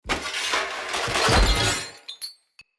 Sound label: Sword Heavy Attack Charge; Weapons; Enemy; Swords; Mnd King Swords